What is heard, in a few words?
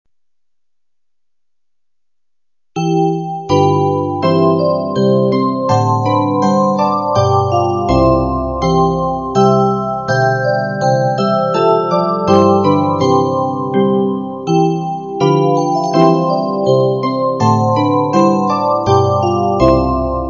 Descant; David City